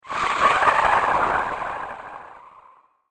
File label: Magic Crumble Rock Spell